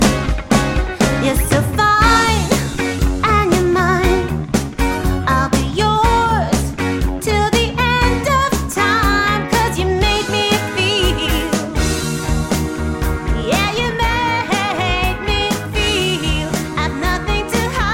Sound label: 80s Band